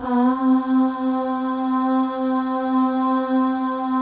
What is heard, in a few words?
AHS, FEM; Spectrasonics; FEM.1 AHS